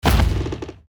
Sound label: archer skill siege stance foot; archer skill siegestance 02